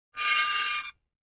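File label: Metal Scrape On Cement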